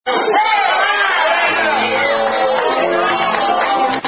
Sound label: Birthday Song; Mt. Olive